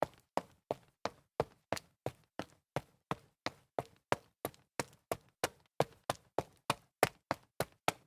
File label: sneaker stairs up jog concrete